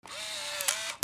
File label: CAMERAS